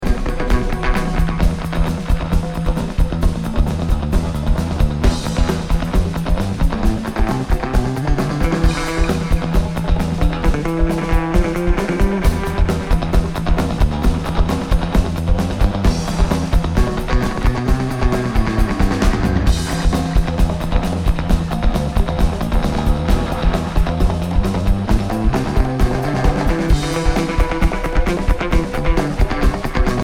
Drums and Bass

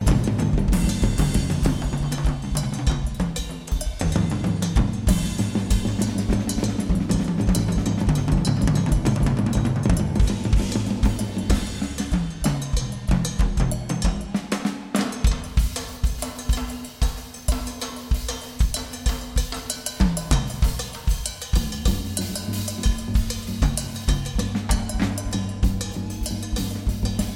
Drums

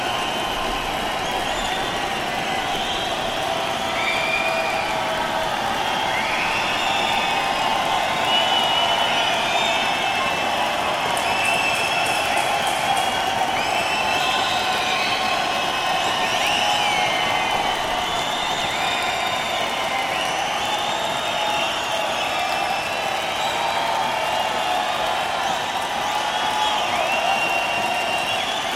Encore Crowd

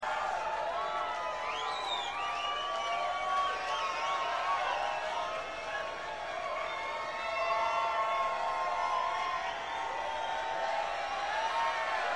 Crowd Wants More

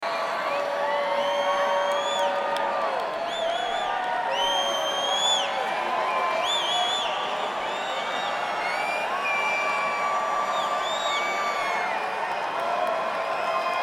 Crowd

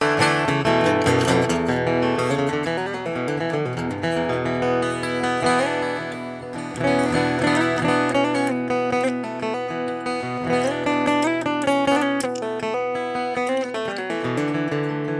Guitar Peace

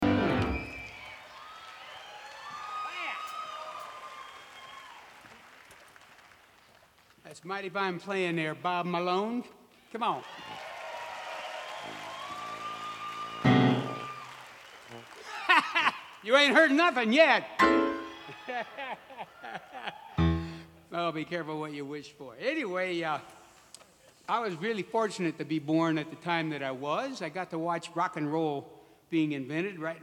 Piano Moment

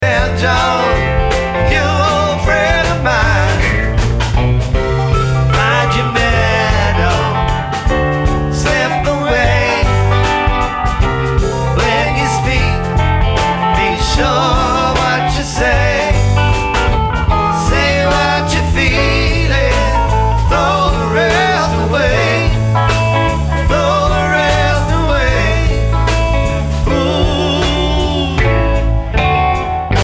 Banjo

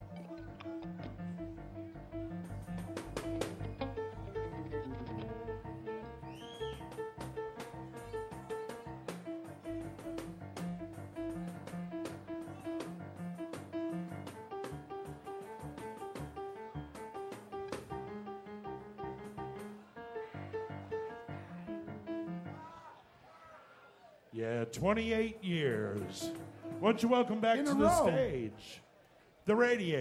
tuning

